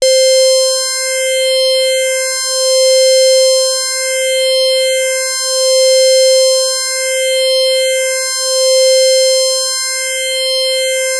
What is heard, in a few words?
C5, Korg MS-2000; Synthworx